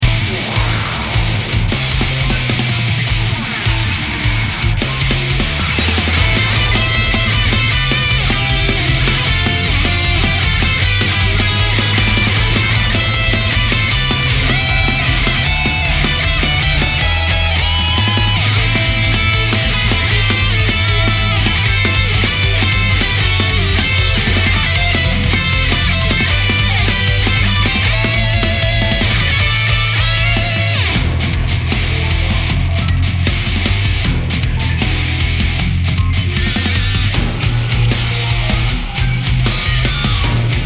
Battle; rpg maker mv

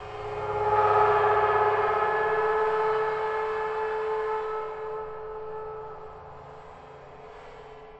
Combat Drone

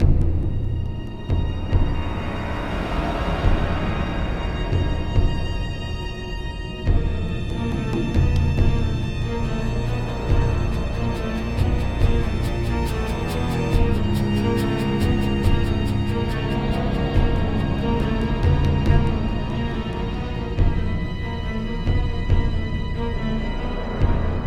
String; Assassin's Creed Unity Dead Kings

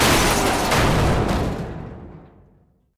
car lifter fall

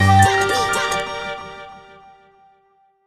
Retry Jingle; Friday Night Funkin' Freedom Funkin' OST mod Windows gamerip 2023

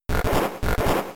Snore; pokemon; gen 3; attack moves

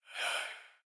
Ghost; Peace, Death; Fatecard

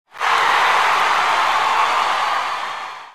Result Scream

